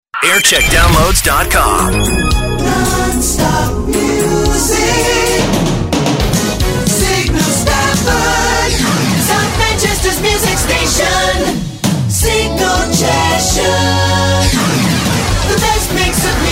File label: jingles; signal